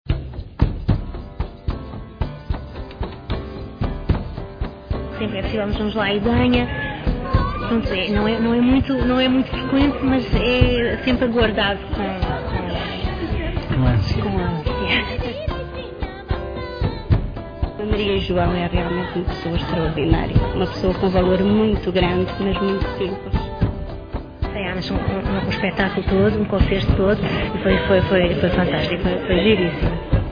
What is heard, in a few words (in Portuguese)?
adufeiras